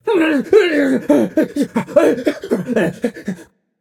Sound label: fear; smite; hebo